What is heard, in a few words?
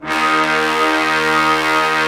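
Brass Ensemble mute; Roland LCDP06 Brass Sections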